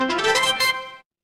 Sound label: feedback level up